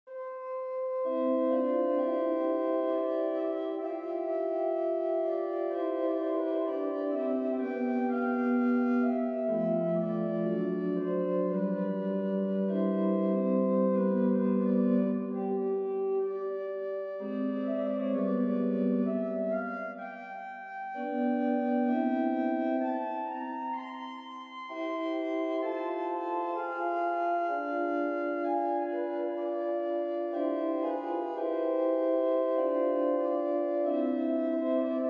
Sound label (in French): Recit Flute (ou hautbois), OrganMusic; Livre Souvigny